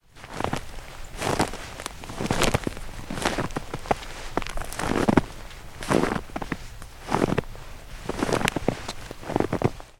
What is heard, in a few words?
snow footstep